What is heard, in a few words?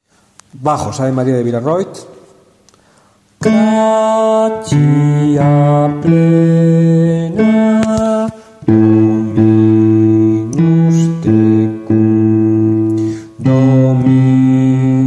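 bass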